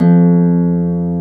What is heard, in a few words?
HARP; Greytsounds; HARP+STEAMER